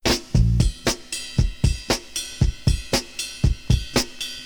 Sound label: Rim Shots